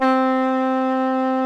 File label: C3, tarogato; SoundBytes - Early Patches